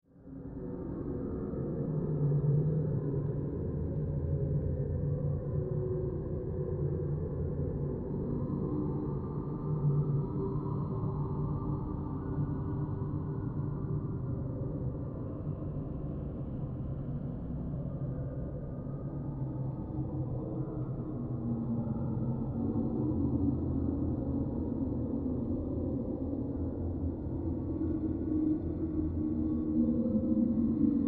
Horror Enchanted Forest Dark Spooky Drone Ambience; Scary Soundscape Sound Effects; SFX Bible 6